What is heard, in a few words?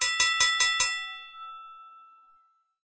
Lane train before